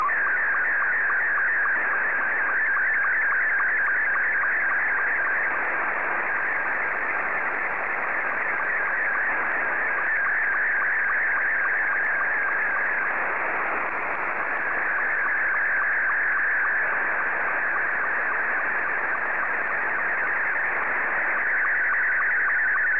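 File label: SSTV, Scottie 2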